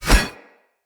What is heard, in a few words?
Sinecure Curved Sword Whoosh Left Right, Swords; Weapons; Player